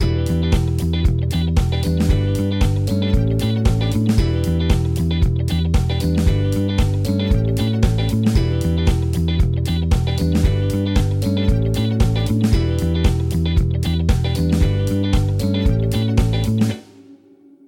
The brass stabs part; 迪斯科; 如何制作迪斯科（disco）音乐

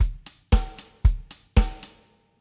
Hi-hats; 迪斯科; 如何制作迪斯科（disco）音乐

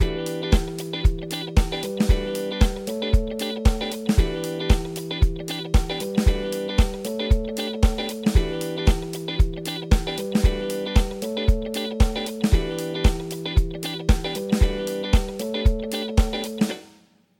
The chorus keys, disco; 迪斯科; 如何制作迪斯科（disco）音乐

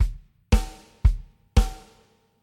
Snare drums; 迪斯科; 如何制作迪斯科（disco）音乐

